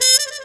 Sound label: Style Trumpet; Proteus 2000; Digital Sound Factory Proteus 2000